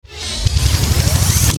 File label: Power Up Engine Whine Ascend Stutter Deep Suction; Rise And Dive; Blastwave